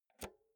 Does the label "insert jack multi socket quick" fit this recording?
Yes